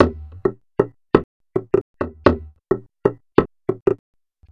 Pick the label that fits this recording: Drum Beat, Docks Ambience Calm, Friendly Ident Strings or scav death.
Drum Beat